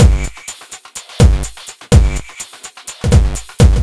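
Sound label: 125 No Snare, Drum Loops; Beatdown 1984; 125bpm; Nu Dance Electro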